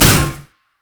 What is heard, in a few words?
orbs missile; Diana